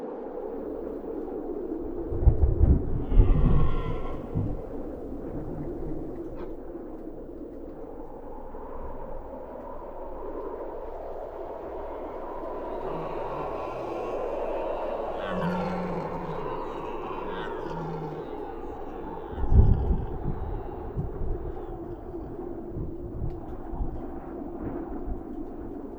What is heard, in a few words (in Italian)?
temporale in lontananza; nuova versione; Inquisitor 2